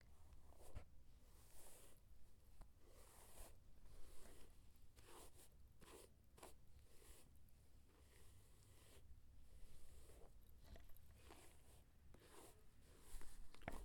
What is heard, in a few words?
Hair Brushing